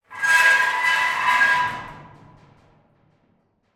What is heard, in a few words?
Dumpster door, from inside, door, slide, rusted, screech, grinding, resonant, light; Eiravaein Works; Vaeyan IV